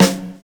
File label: BIG ROLL; Univers Sons - Kitch 70s